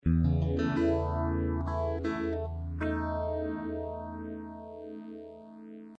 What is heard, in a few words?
Match chief + flanger